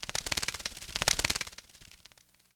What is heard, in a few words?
Bird Fly By